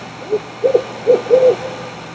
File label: owl hoot